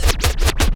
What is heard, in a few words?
Snare Scratch Up; Mo' Phatt; Digital Sound Factory Mo' Phatt